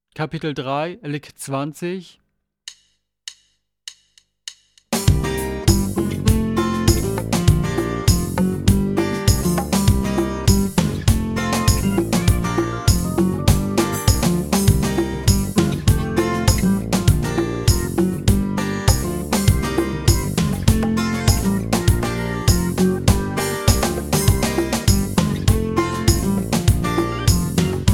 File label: Latin Style Guitar